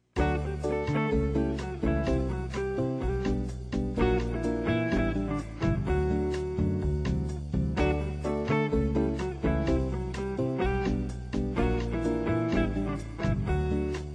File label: cocktail guitar